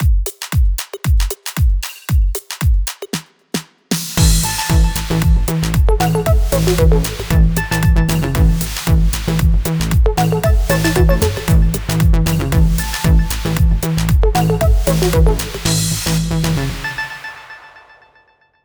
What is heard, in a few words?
Morning Beat